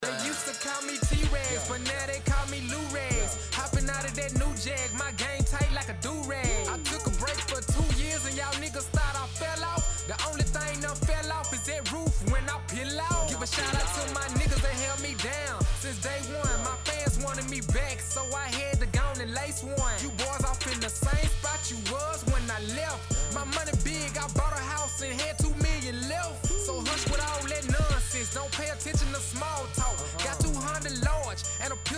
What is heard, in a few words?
trap unmastered; hip hop